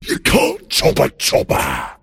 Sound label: last hit pain; pudge